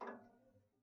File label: Ping, Kitchen Toaster